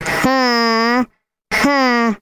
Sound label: Classic Miss Sound; Jolly Battle